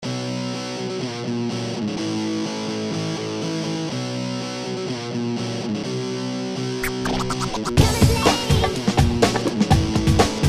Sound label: breakbeat